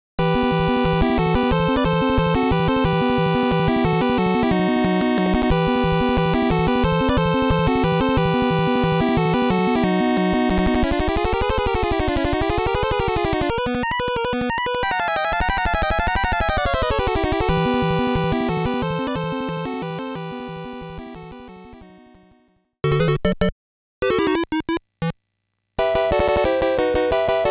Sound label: BGM ~ Mistake ~ Game Over